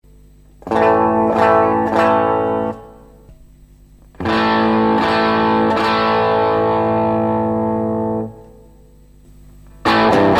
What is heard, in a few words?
tech21 flyrig v2 plexi